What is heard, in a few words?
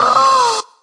Electro FX; Ueb HE Electro FX